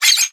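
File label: seagull caw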